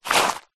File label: foley shoe scrape fast gravel stones on road or path